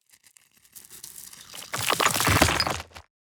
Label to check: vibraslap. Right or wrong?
Wrong